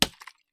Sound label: Water Knock